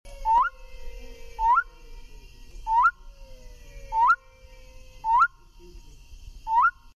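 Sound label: Leptodactylus elenae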